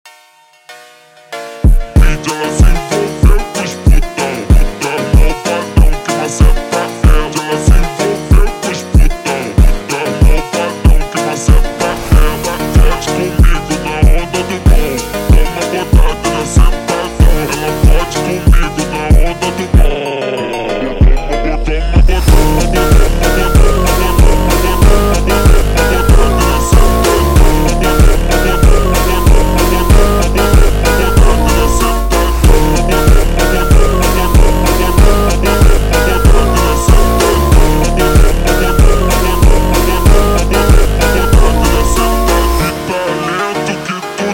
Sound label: ultra Slowed, funk